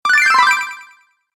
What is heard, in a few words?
Game Echo Bonus, Video Game Sound Effects